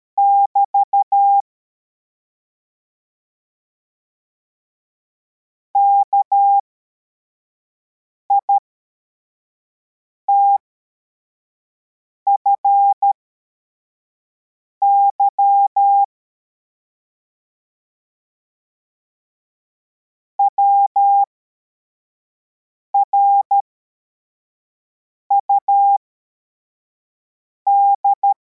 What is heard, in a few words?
cw, morse